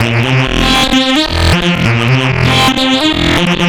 Synths; Underground UK House & Electro; Sonic Foundry (Sony Creative Software)

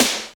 SNR, Tekno Industrial; East West

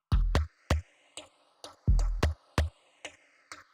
Drum Loop 128BPM Perc; Loop Elements; VEC5 Drumloops; VEC5 Multi Loops 128BPM

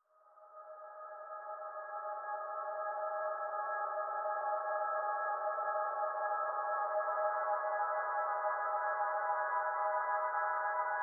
depths pad

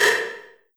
LR R 808, LARGE ROOM 808, REVRB TR 9+8

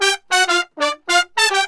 BRASS SALSA; Best Service ProSamples vol.14; AKAI

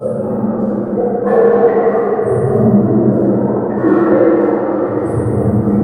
SAD GROOVE; USB Soundscan vol.33; AKAI; Disjointed Textures

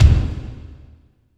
KICK, Tekno Industrial; East West

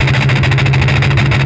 Riff, Industrial Nasty

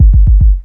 FILL DRUM; USB Soundscan vol.27; FILLACOUS

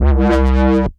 C Bass, DnB One Shots

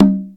Iya, dry perc, Quasimidi Rave-o-lution 309

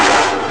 Missile Sound; Amiga Soundtracker Sample Packs

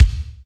thick kick; Greytsounds; Scott Peers Sound Engineering

